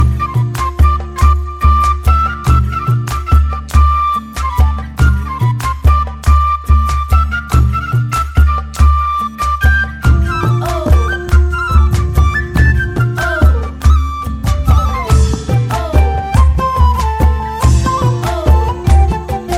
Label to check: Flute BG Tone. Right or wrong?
Right